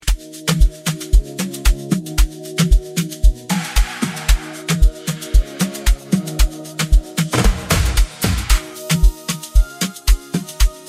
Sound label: ORANGE Energy INSTRUMENTAL